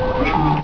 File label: MECH SEL; 机器声音音乐素材